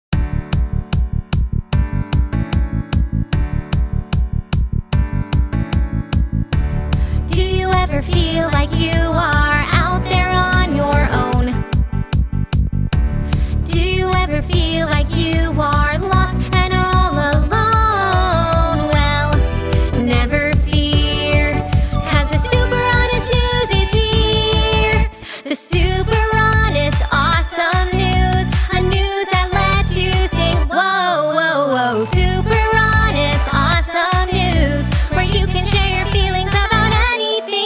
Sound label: VOCAL